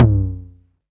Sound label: low mid tom drum sound f sharp key, tom one shot tuned to the F# note; tom drum samples